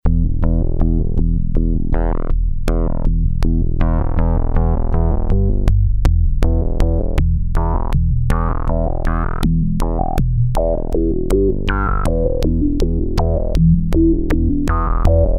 intro bass

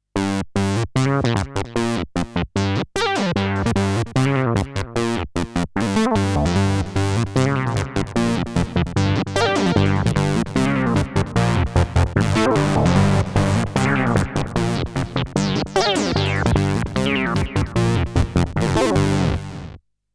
Raffy Lead

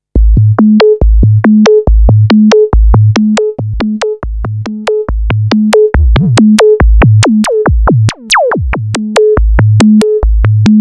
filter sound

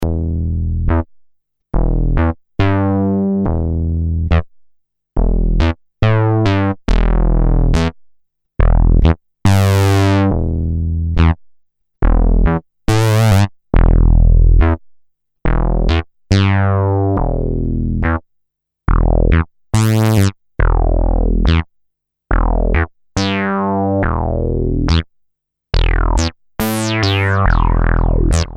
2 saws

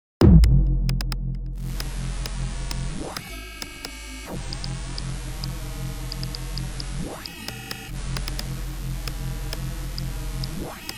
Noises

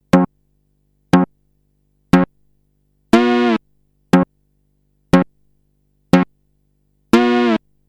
stabs

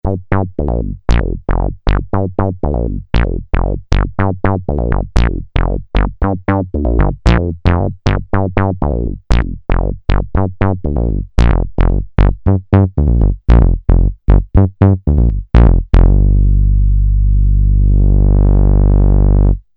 single saw

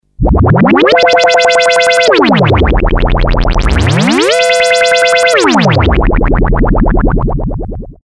burble

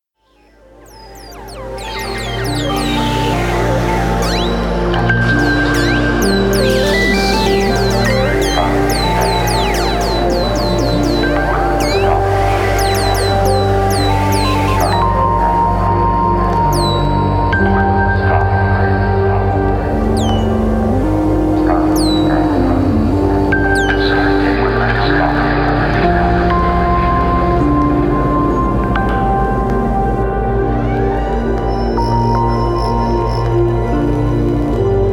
breeze

